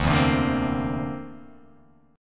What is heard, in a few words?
D 7th Suspended 2nd Sharp 5th D